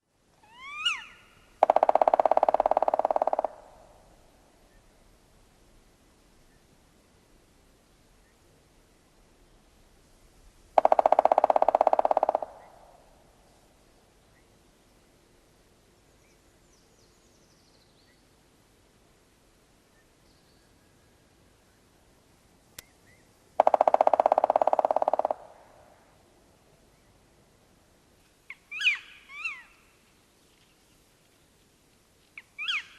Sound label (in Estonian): Mustrahn, Eestimaa looduse haaled